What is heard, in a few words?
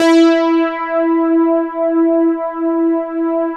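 E4 PAD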